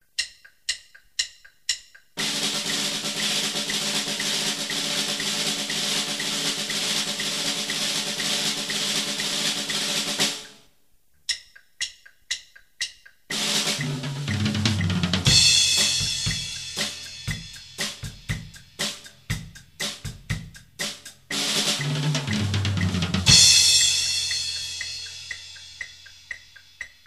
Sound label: 5 stroke